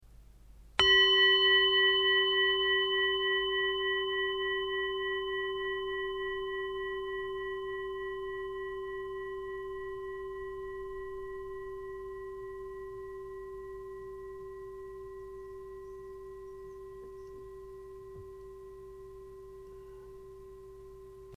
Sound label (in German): Planetenton